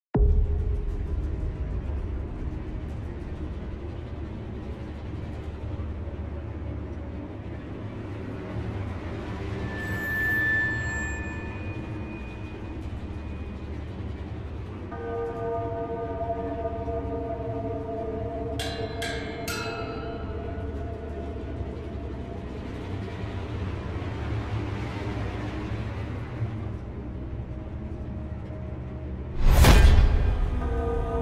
horror trailer music epic intense hybrid horror music